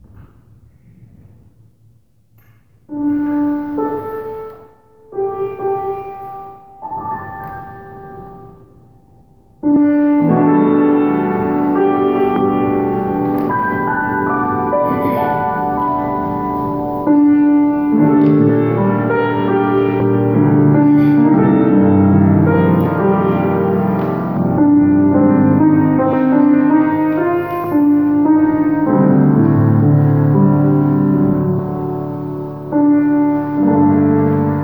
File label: Offertory Instrumental